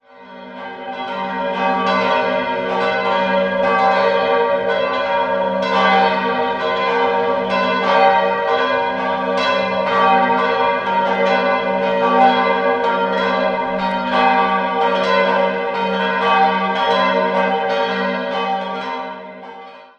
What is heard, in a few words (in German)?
Amberg, Glocken